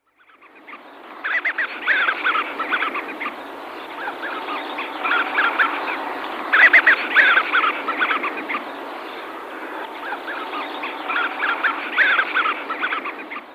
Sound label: Greater Scaup males